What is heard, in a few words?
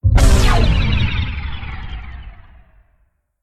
game over